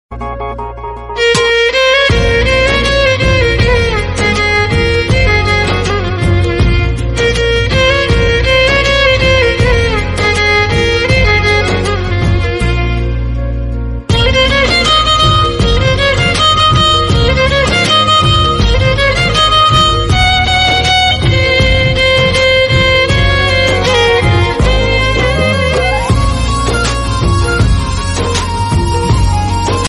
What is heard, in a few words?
instrumental ringtone